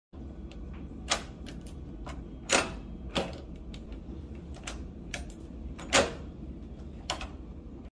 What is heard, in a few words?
Door Handle